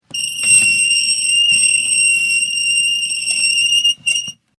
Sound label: metal scrape on concrete, highpitched, piercing; Squeak; Objects